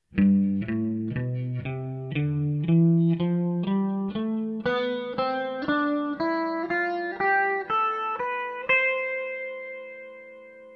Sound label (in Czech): mixolydicka, kytara